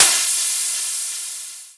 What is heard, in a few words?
acid shield; gameplay; shields; epervier-framework